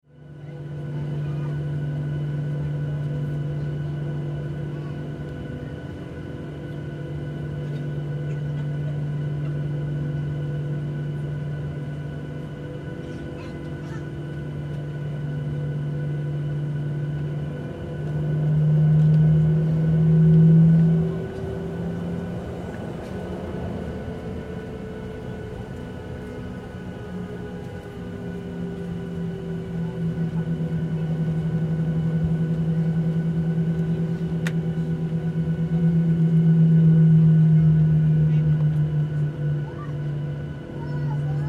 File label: Airplane Taxi Down Runway Interior Commercial Airline; Vehicle; Airplanes